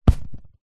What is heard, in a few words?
mattress grab hand; household